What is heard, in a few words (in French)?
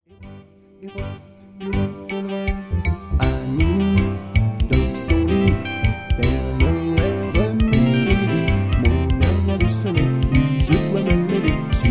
pere noel rockeur